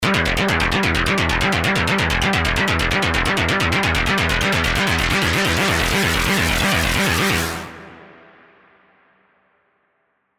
Stab FM; SERUM

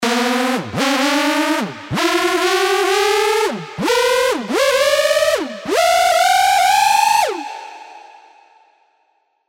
Tonal Risers (128 BPM) 4bar A#

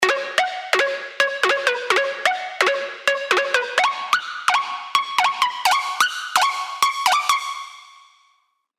Lo-Fi Percussion

